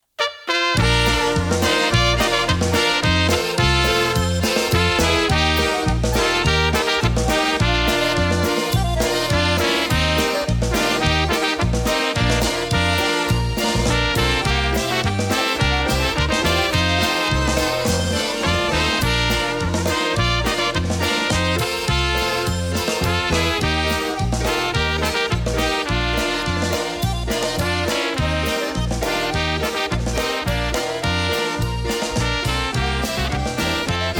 Polka Inst.

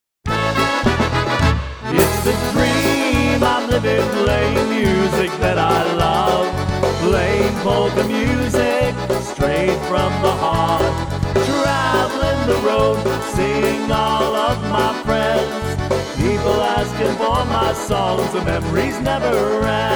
Polka

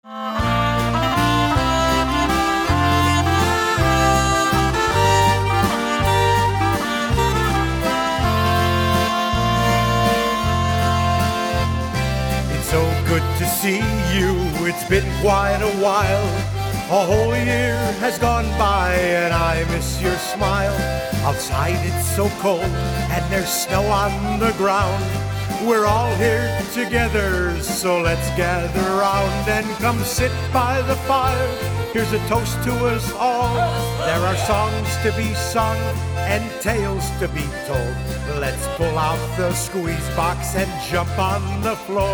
Christmas Waltz

